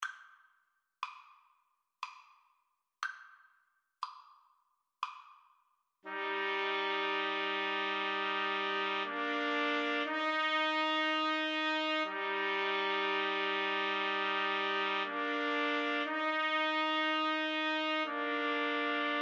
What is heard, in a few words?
clarinet concerto, trumpet trio